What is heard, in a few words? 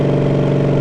engine diesel; genset